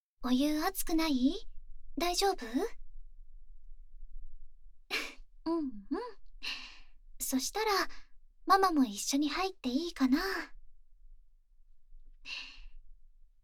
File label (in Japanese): ぽかぽかくっつきお風呂（バイノーラルマイク 効果音あり大きめ）